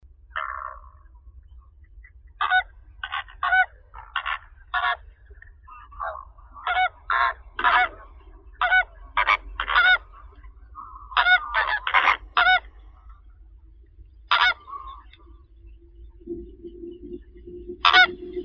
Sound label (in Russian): flamingo rozovii phoenicopterus roseus